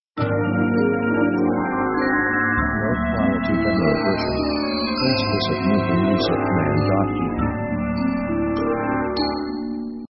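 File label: orchestra mystic; strange themes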